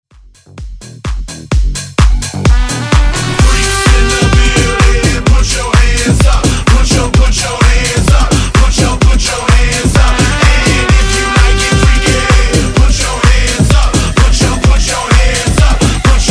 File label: Hype Rework 128 Bpm